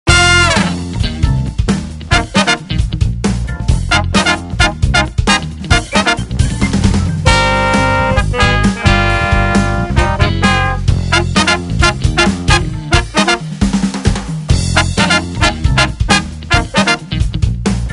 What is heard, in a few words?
Funk, horns